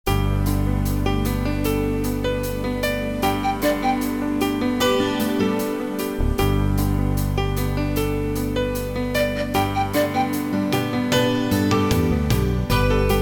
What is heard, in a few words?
Bongos Karaoke play along